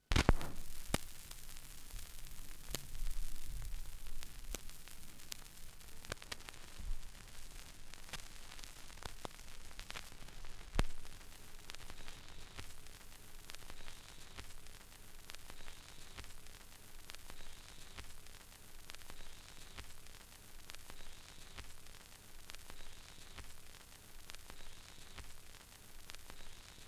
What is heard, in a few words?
Blue Grass Gospel; schallplattengeraeusche